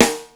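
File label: snare picolo rim, Yamaha Motif ES drum machine; 300 Drum Machines; Yamaha Motif ES-Series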